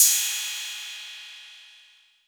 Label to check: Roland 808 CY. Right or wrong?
Right